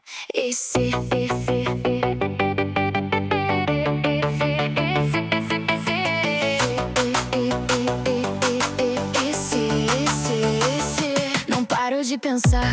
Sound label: jingle